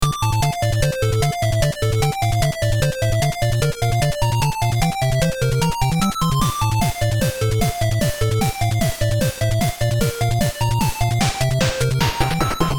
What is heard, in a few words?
8-Bit Space Adventure Theme, loopable 8-bit theme